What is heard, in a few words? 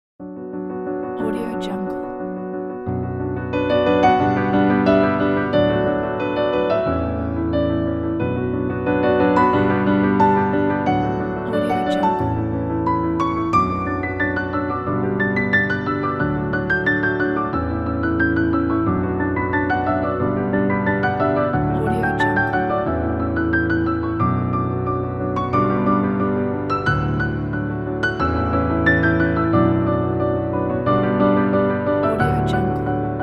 fast upbeat energetic piano